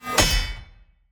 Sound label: sword hit; Shelter